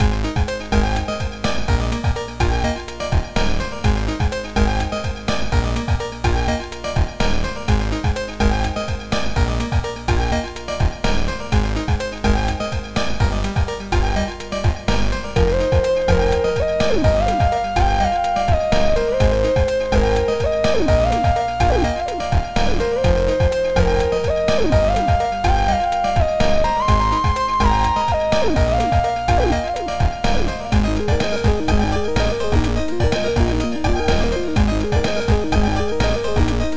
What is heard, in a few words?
Guitar; HYBRID; Uberschall; Liquid Instrument